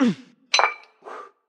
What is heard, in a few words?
Bench Press